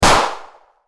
Gunshot

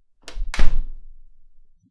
Door closing

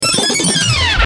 VHS rewind

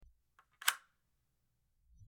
Phone unlock

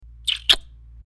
Kiss

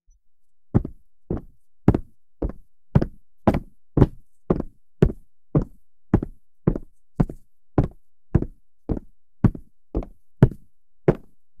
Heavy boots walking; Sound FX

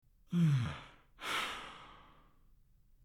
Man Sigh

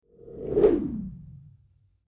swoosh; Sound FX

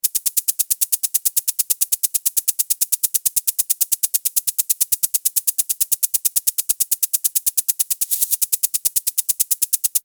Sprinkler

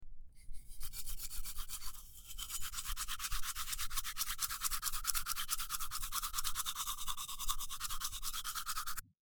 brushing teeth

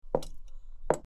High heels walking; Sound FX

